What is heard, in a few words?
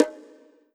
Korg N1R Low Bongo